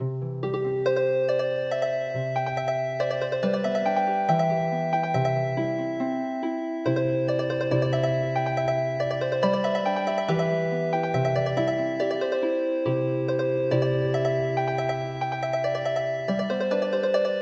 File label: Healing Harp, midi traditional; Weeding Song Nanyatoraya